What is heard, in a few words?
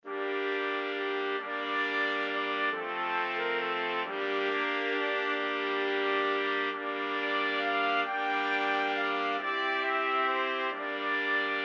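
4 trumpets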